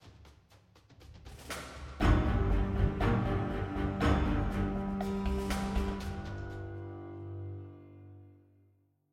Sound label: String; assassin s creed unity gamerip 2014